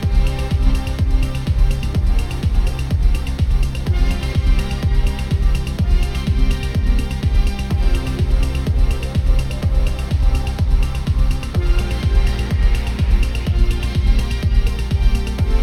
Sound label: free spin music loop; spinsane